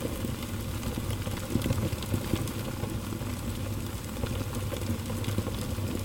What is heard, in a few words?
singed loop; fridaynightfunkin; weekend1